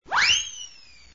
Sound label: fly; danceline; RubikCube